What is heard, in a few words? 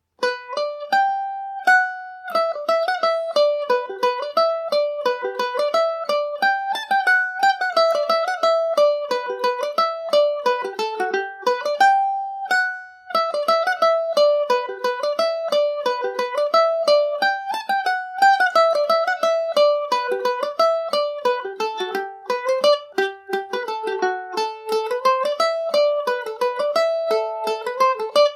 Irish mandolin; granny in the wood revisited